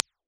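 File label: impulse response Oktava MK18 Silver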